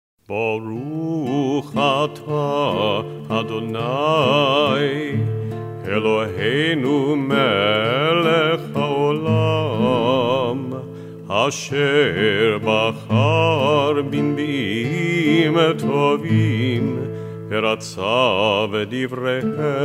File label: Blessing Before Haftarah chante